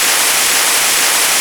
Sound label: Ocean Wave